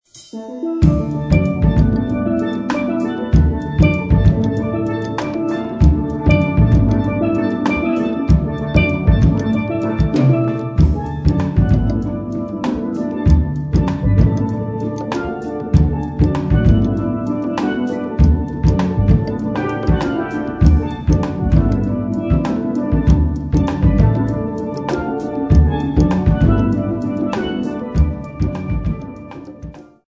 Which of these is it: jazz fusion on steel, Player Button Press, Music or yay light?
jazz fusion on steel